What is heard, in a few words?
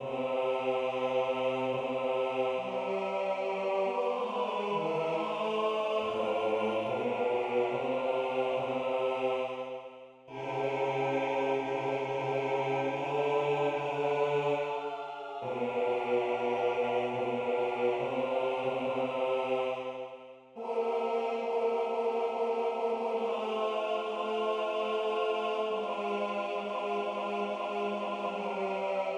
Sanctus Bass